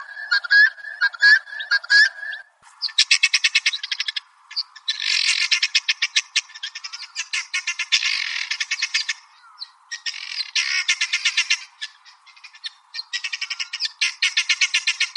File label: helmeted guineafowl ringtone